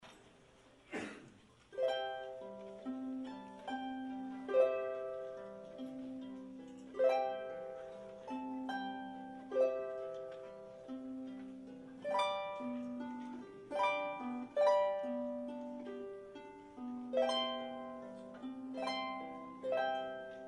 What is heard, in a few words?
solo harp